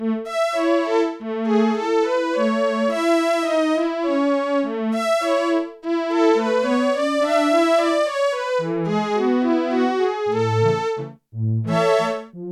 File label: STRINGS, Korg DW-8000; Factory Patches